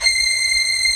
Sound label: STRING SECTION C6, Strings; STRING SECTION #1